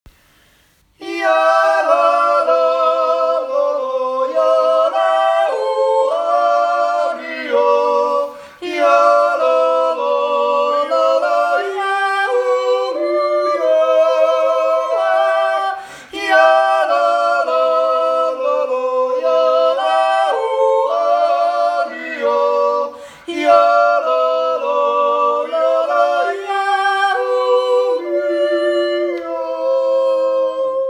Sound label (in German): Jodel